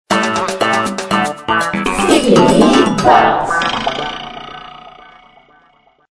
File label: Jingle (Level Clear); Sticky Balls; Gizmondo, iOS